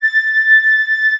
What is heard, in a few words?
flute